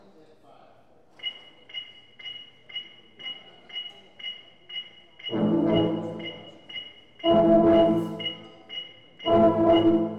Low Brass; woburn ma; North Star Brass

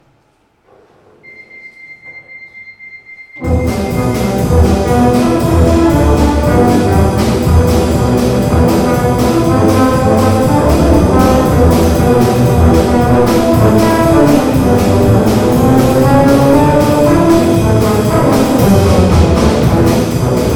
Cantina Run Low Brass; woburn ma; North Star Brass

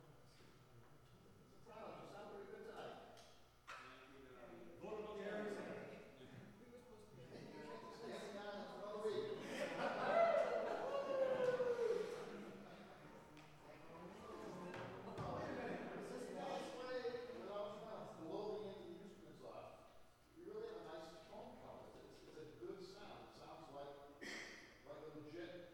Sopranos Sounding Good Tonight, brass

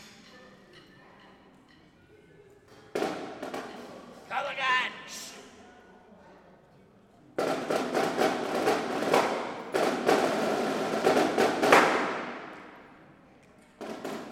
Snare Cadence

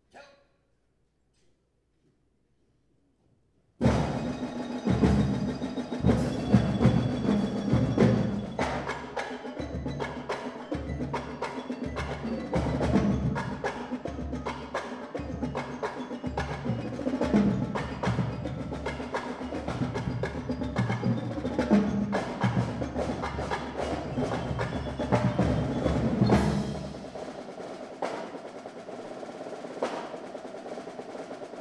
Drum Solo; north star york pa

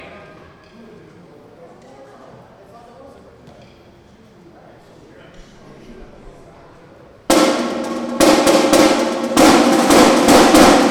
Drum Intro

